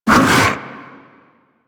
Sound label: Barrier Cast; Warframe; Warframes; Brawler